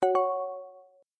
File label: notification